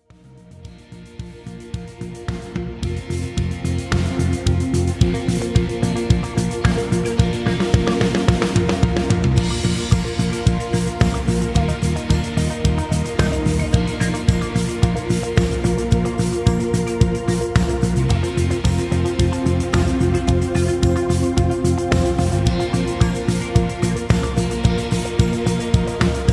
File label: rhythm